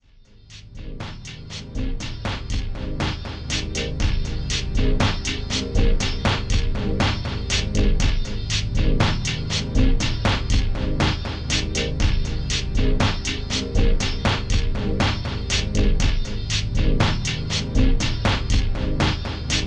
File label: loop; Wintry Cliffs